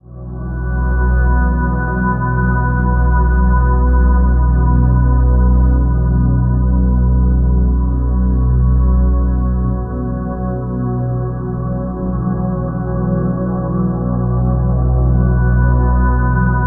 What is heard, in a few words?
DRONE, guitar; Spectrasonics; Bizarre Guitar; SOOTHING